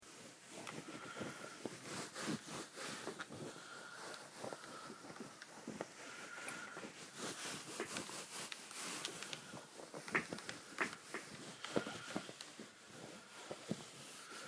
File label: Electrified Environment